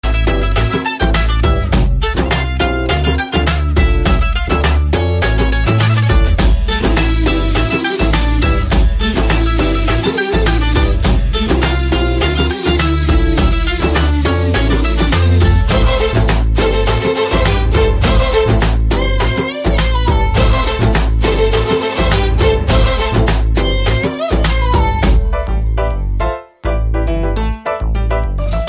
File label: Violin Mix